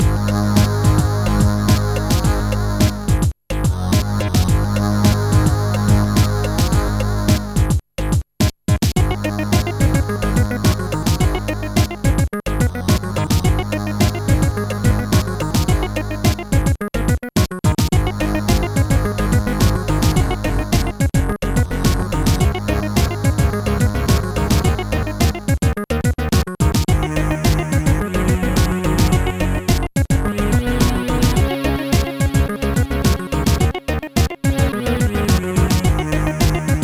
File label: slow rythm, chiptune